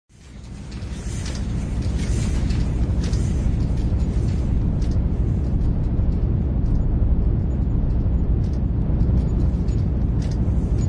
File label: industrial sounds